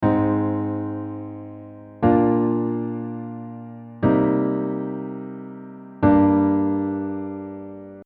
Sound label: Chord Progression